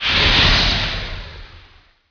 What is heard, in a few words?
flame burst